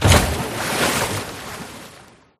airdrop crash

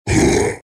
monster orc attack anticipation

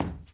footstep carpet

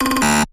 door error

